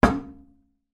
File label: impacts glass table hit with knuckle